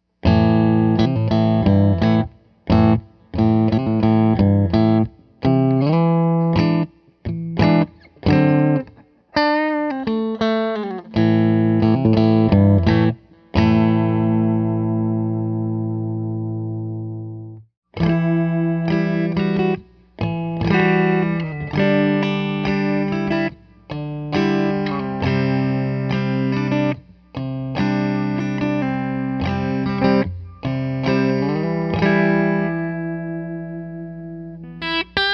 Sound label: bridge clean, pickups